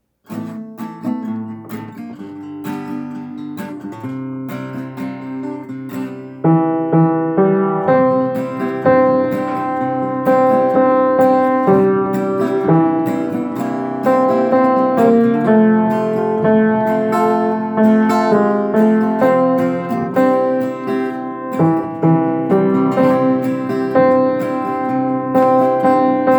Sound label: Tenor Piano